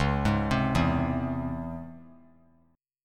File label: C sharp Minor Major 7th C sharp, chord; C#mM7 arpeggiated; JGuitar